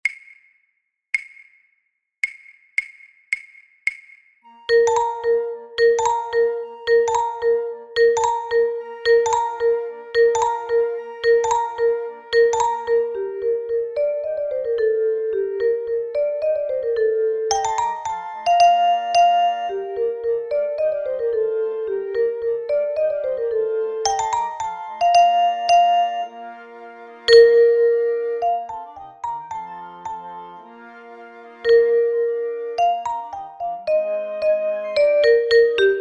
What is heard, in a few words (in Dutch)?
Early Hungarian Dances fluit 2